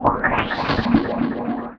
Synth Wah & Tape Delay, contact mic, Tin Of Guitar Strings; musicradar; contact mic madness